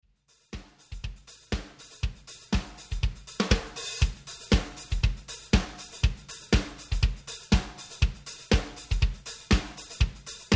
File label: 120 heavy rock full